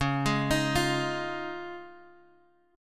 Db Major Add 9th, Guitar, Drop D

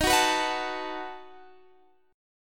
B 7th Eb

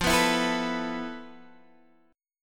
F Major 7th Flat 5th Guitar Open G, chord; down; chord shape

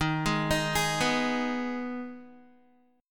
Eb Suspended 4th Sharp 5th, Guitar; Ebsus4#5 arpeggiated; JGuitar

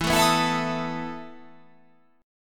E Major 7th Suspended 4th E

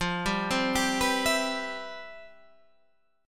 C Major 7th, F, guitar chord; Cmaj7/F; arpeggiated

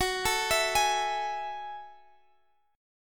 Gb Minor 9th

